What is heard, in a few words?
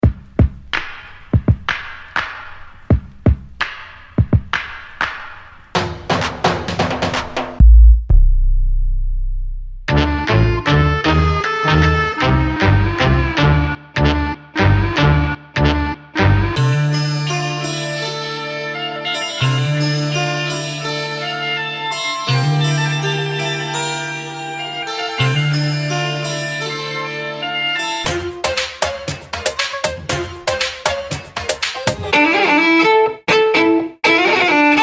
Love Beats Tender Samples; Burntablism Volume 1